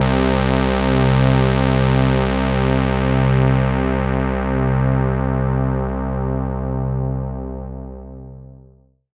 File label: pad C2, EMS VCS3; Polyend; Simulacrum Sounds; 1 Shots; Synths; Pads